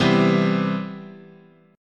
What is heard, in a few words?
C Major 7th, guitar chord